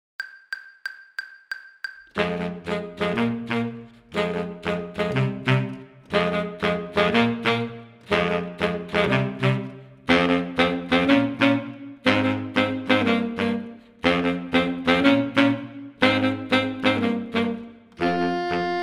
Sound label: playalong tenor; battlefield 1942